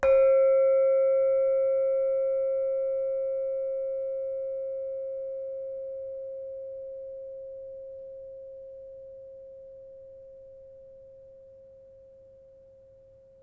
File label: Demung soft, gamelan